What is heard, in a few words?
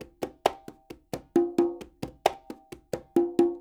NYC Percussionworks loops; Sampleheads